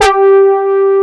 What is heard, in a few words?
HO G3, ANA HRN